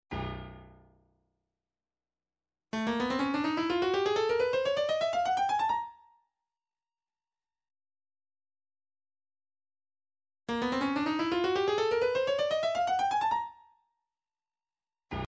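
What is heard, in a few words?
126 filter sweep shaker, midi; dirvish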